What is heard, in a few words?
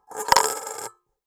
Coin On Collander